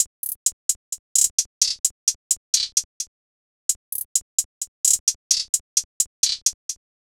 Run Dat Hihat Loop - 130 BPM; Cymatics; Fiji Hihat Loops & Percussion

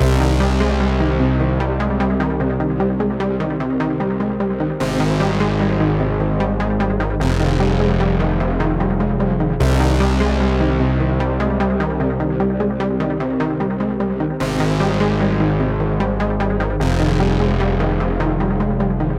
150 BPM C Min, Synth Loops; JU60+MM; Cymatics - Fantasy Synth Sample Pack

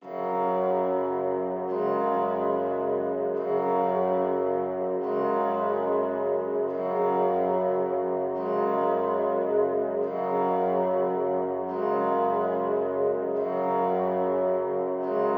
144 BPM F# Min Piano; Cymatics; 2022 Melody Collection; Drill; Bando

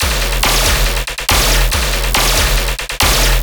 Bass Loop E 140BPM, Dubstep; AC2024 - Day 13 - Dubstep Sounds 2025; Glory Bass

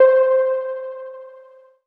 KEYS Pacific (C), One Shots; Cymatics; Mystery Pack Vol 4

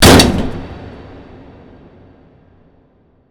impact hatch; Hits

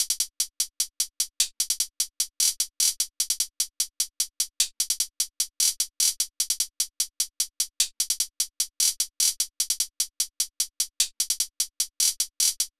Drum Loop, 150 BPM Hihat; Loop Stems; Cymatics; DOPE DRUMS VOL. 1; Worlds

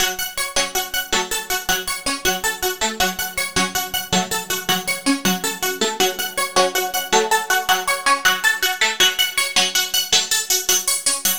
Synth Loops F# 160; Trap and Dubstep; Curse

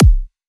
G House Kick - F; Drums; One Shots; Kicks

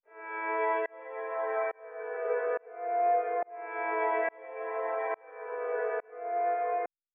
140 BPM A Min Intro Piano; Cymatics; Fearless; 2022 Melody Collection; Drill

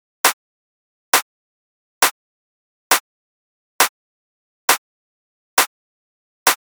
Clap Loop 135, Techno; Drums; Ghosthack; Techno Sounds 2025

